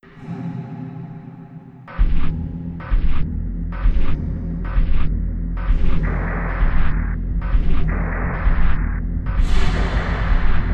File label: Game Over Ambience; Spooky's Jumpscare Mansion HD Renovation; ps4